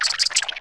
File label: spore neutral